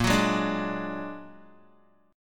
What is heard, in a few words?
Bb Augmented 7th Bb Guitar, chord; strummed; 6,9,6,7,8,x; 4E,4A,5D,5G,5C,6F; JGuitar